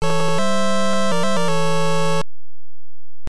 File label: Power Up; Exed Exes; NES